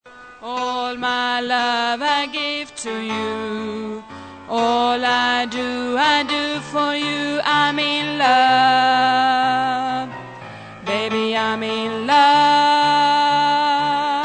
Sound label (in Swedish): bas